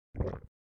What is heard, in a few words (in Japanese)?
何かを飲み込む音; vore